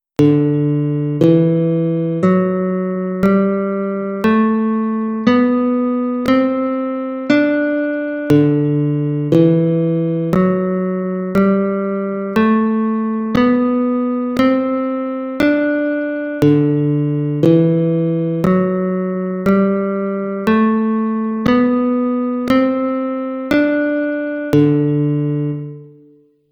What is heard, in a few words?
D Mixolydisch Scale Guitar